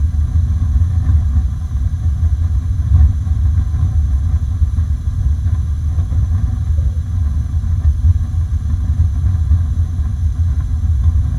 Hot water Heater

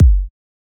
Kick Drum

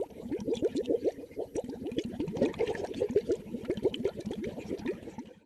Bubbles Low

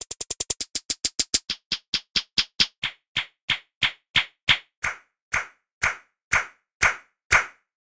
Clackers Simulation

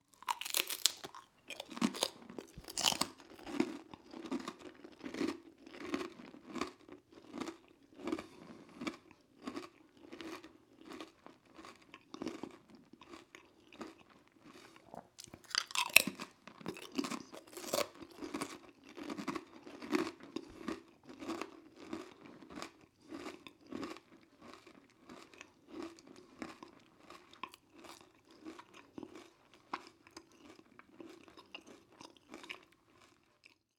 Crispbread

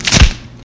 Tearing Flesh

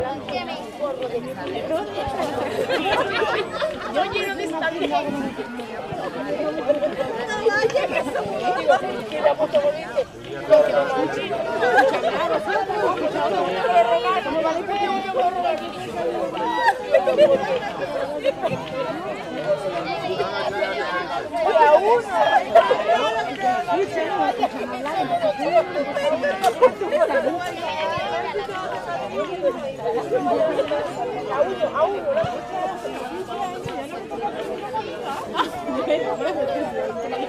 Outdoor Party

